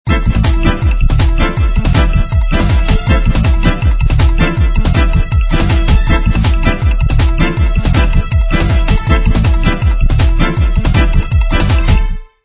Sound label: Intense Transition Lo